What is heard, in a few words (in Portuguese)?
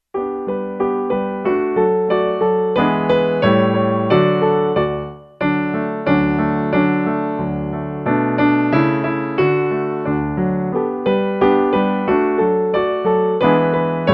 O pato e a galinha PIANO